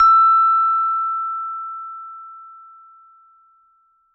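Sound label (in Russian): вибрирующий звук ноты до 5 октава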